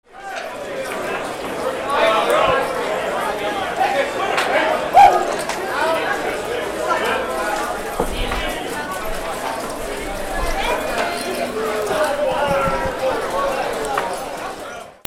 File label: CASINO